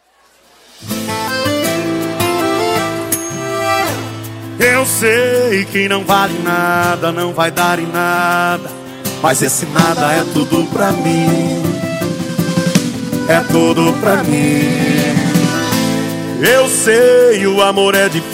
eletro funk e sertanejo

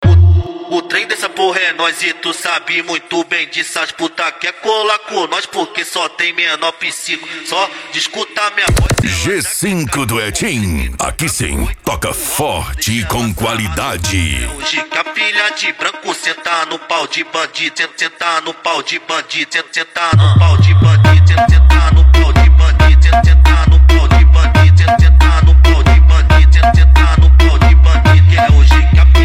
mega funk e funk mega bass

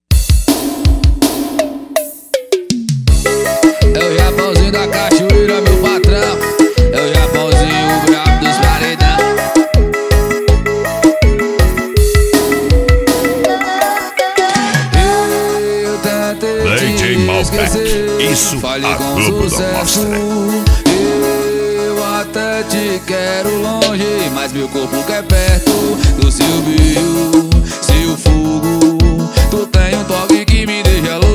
sertanejo; loja evolusom

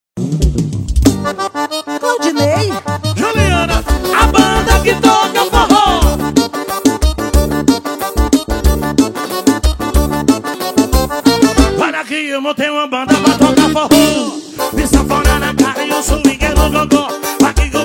forro e brega; vazante mg

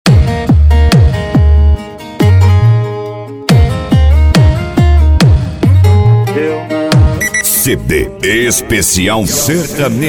sertanejo automotivo

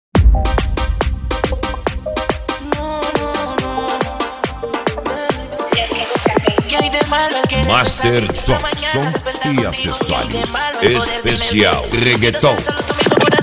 reggaeton

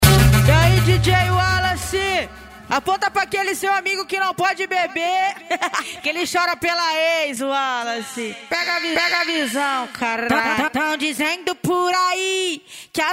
funk ritmado; especial cuiaba

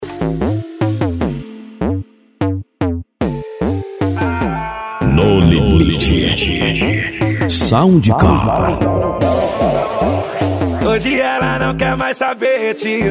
sound car especial mix

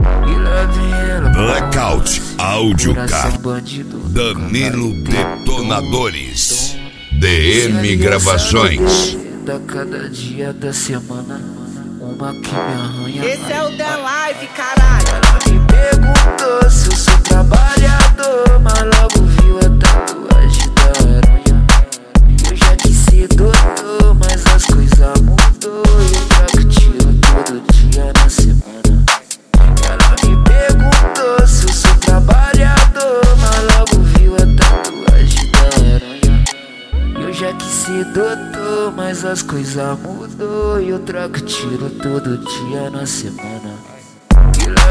funk house remix

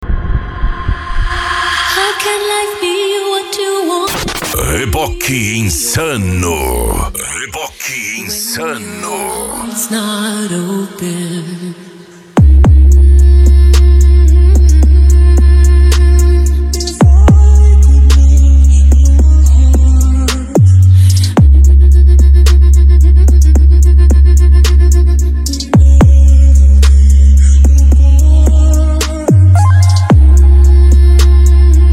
noia dance e funk mega bass

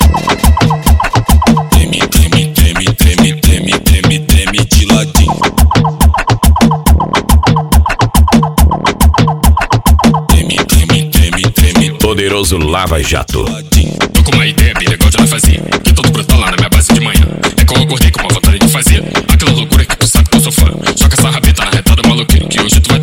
funk sertanejo

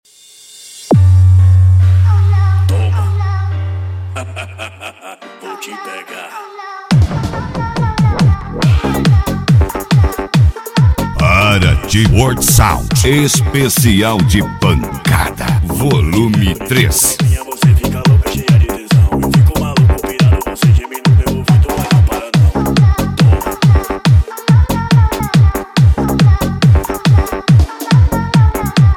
esp pancada

